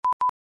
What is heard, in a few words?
keycode panel reject